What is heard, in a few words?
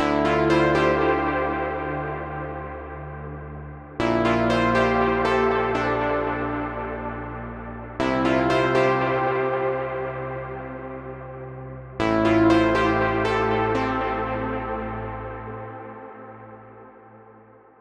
starfarer pluck; eightysix; morphoice